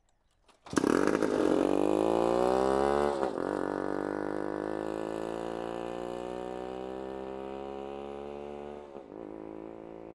Bike Start And Exit To The Left

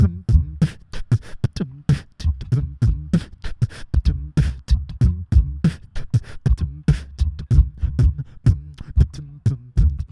Beatbox

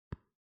Touchscreen

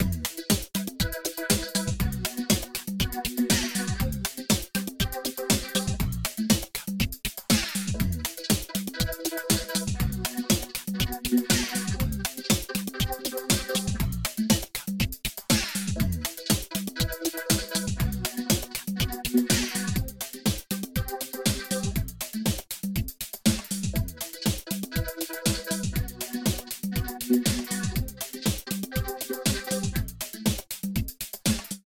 Pop Rock Loop

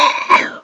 Zombie

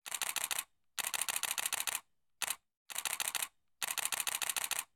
Camera Shutter On Hyper Speed

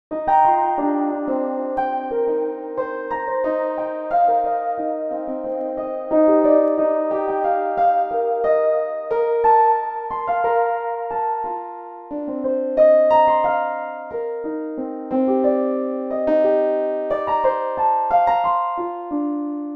Piano

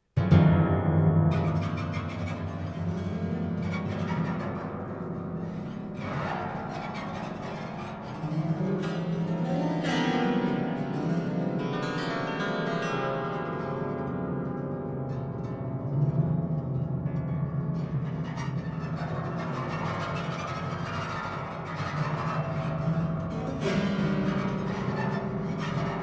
Piano Harp Scratched

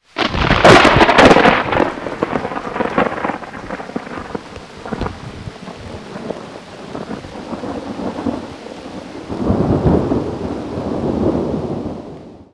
Large Thunder Crash

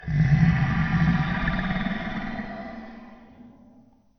Dying beast

